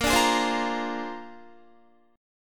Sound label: Bb 9th Bb down Guitar 4F,4A,5C,5G,5C,6E; strummed